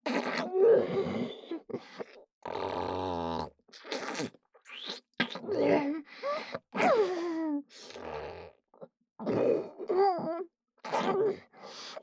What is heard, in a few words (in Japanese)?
無垢 喉奥口フェラ, ASMR; フェラ素材